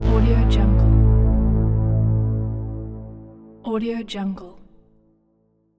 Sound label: 80s synth brass